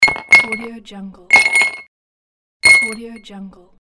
ice cubes

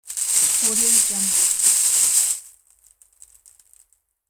cellophane

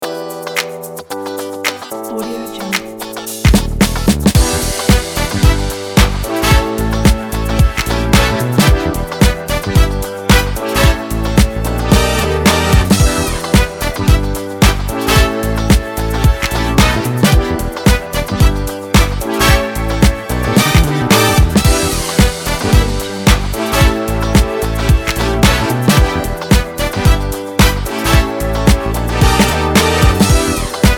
funk groove

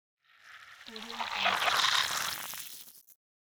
organic horror interface long fleshy reveal

